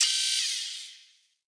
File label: item internal storage open